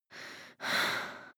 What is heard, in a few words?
breath female out of puff